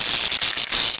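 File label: ambience electric arc; Star Wars Knights Of The Old Republic II; XBOX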